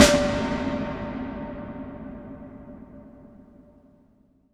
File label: IMP SNR DRUM, Drum, Cinematic Impact